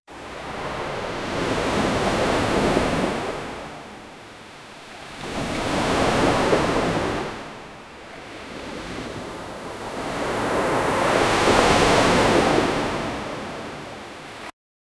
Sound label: ocean ship waves; ships